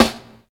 acoustic snare a sharp key; snare drum samples

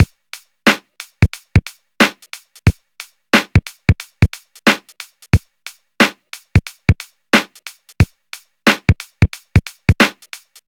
90 bpm drum beat g key; drum loop

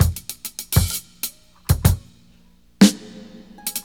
62 bpm 00s drum loop e key

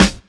rich lows snare drum b key

